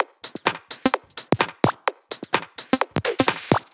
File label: Drumloops 128BPM